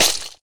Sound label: bullet body impact